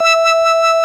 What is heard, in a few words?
ORG VOX E; Roland LCDP09 Keys of the 60s and 70s 1